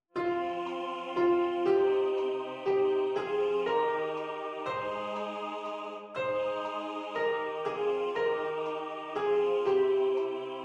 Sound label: Soprano Piano; Puisque Vous Nous Avez Fui